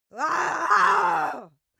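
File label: female aggressive, screams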